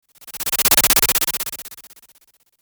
Strobe, transition

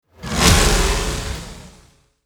Aggressive, transition

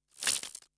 Glitch, noise

